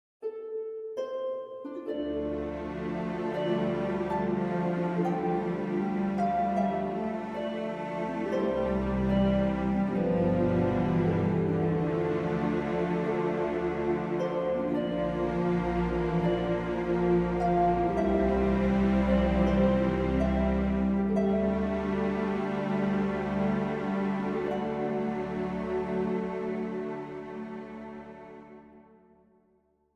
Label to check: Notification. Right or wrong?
Wrong